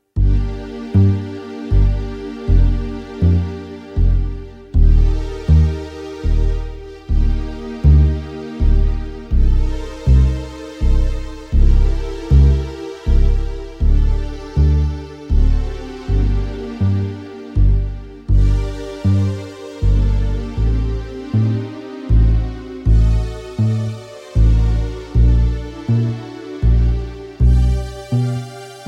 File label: Albinoni Adagio Por Cordes Bass; freeboards2013